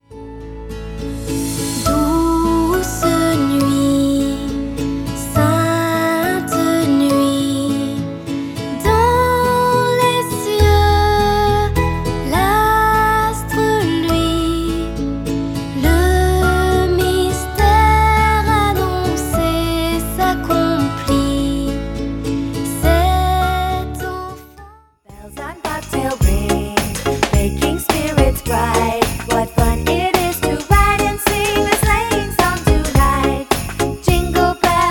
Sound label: Christmas songs; chants de noël; faba